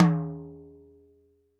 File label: TD-20 Tom A, Roland TD-20 Toms